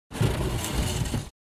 Sliding metal window

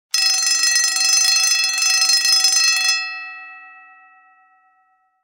Emergency bell fast ringing

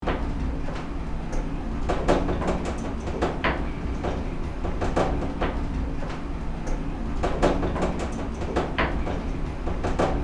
Service elevator moving moderate clanging

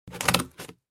Scissors cut cardboard

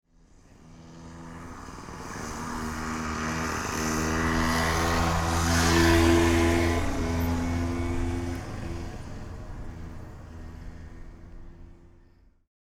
City scooter passing by close exterior

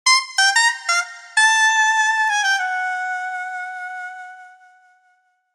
Sitcom accent trumpet jazz

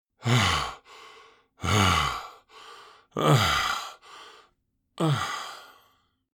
Senior male breathing moans

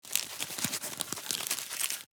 Insect little monster scuttling tiny movement

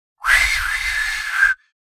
Human failed whistle

